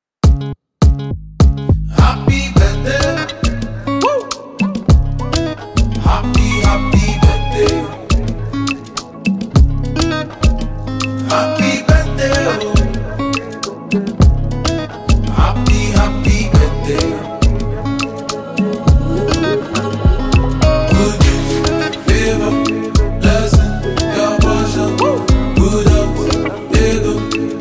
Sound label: Happy Birthday Song